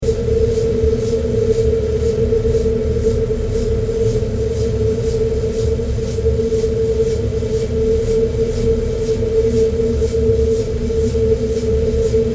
Spaceship Generator Ambience 120bpm; Sound Design

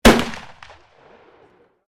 RIFLE MOSIN NAGANT 9130 FIRING; Rifle 1930; Rifles, military; Guns

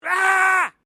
MALE SCREAM MID; Male Vocal sounds; Screaming (Sound Effects:Voice)

